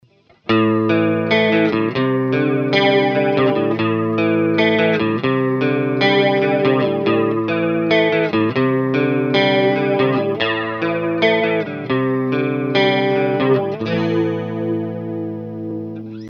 Jet flanger

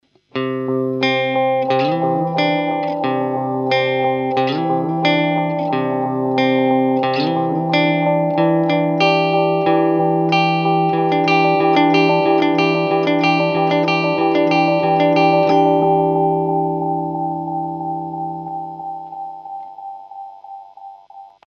Lo res delay

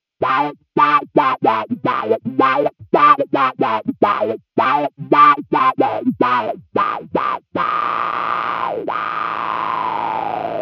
Growler

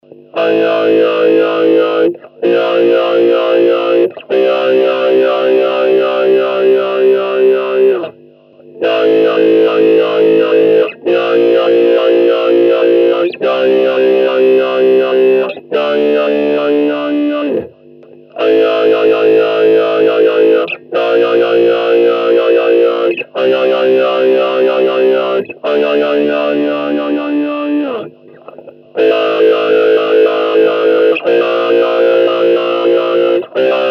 Voice box

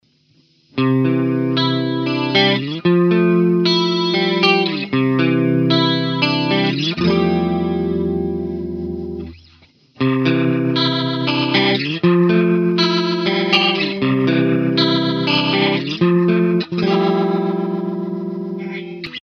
Rotary drum with horn

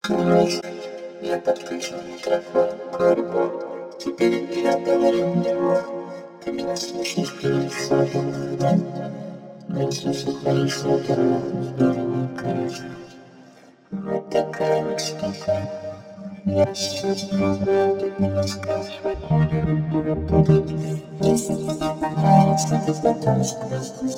Korg R3 Vocoder

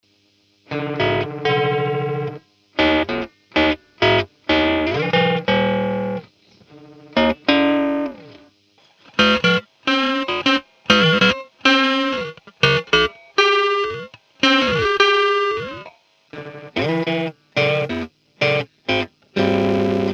Ring modulator

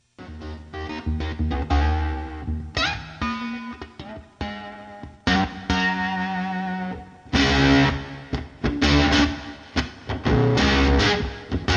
octafuzz

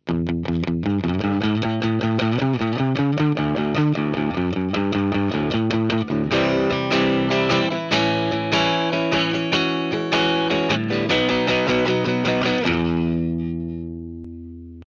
Colordrive

